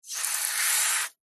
water jet spray from hose on flip flop cleaning short burst